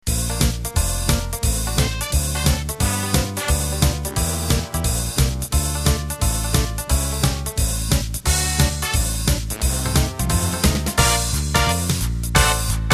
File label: Flapper; Flapper X & Flapper Y